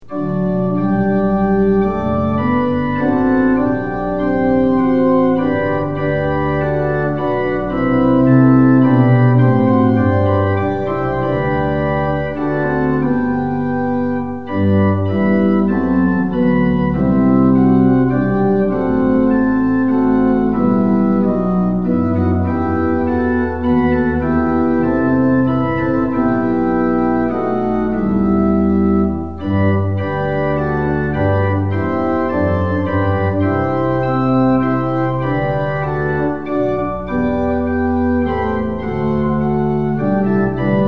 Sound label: tune; hymns; lillenas